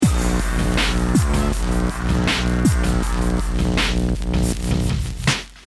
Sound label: deathcam; music